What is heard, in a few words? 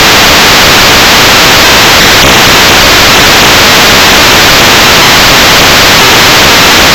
Noise Floor; Carnival